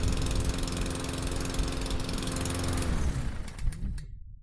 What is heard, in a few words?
lawn mower cutoff